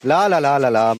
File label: lalalala